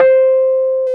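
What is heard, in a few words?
Piano C4 f 73, Rhodes 73 Suitcase; Keyboards of The 60's and 70's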